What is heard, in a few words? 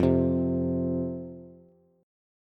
F 5th F, down, Bass; F5 strummed